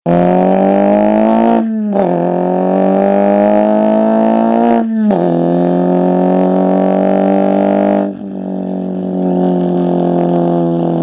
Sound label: mitsubishi lancer evolution; splat